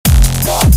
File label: Dubstep